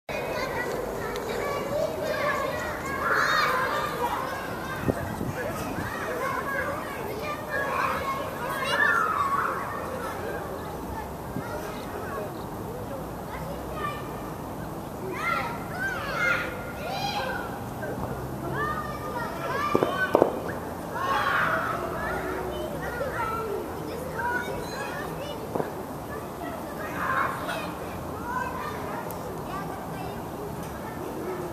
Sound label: children's playground